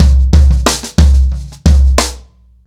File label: 122 bpm drum loop c key